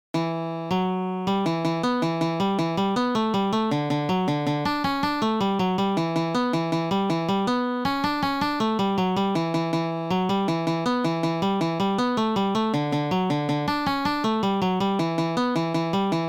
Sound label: swallow tail jig